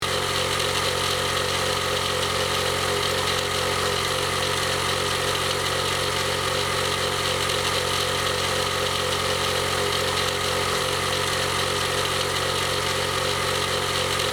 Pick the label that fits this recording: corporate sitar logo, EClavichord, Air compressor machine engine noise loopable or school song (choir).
Air compressor machine engine noise loopable